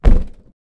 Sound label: Bounce